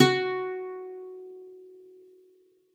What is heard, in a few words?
Soft F#4, Campfire Nylon Guitar